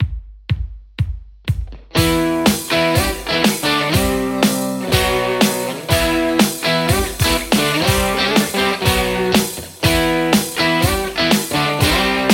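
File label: Stratocaster; acme brain2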